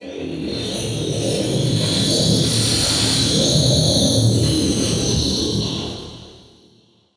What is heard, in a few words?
alien; Zaero; tomb